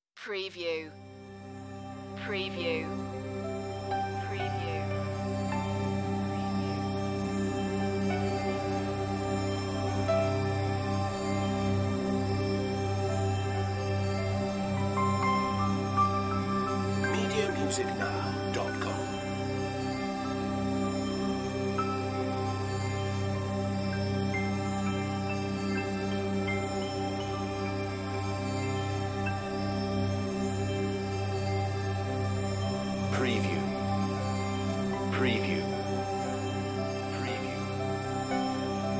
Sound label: Trailer Production Elements - Texture - Blooming, Angelic, Piano, Shimmer